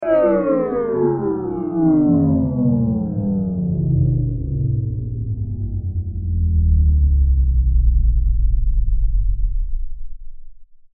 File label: Sci Fi Power Down Malfunction Engine Shut Off; Spacecraft; Blastwave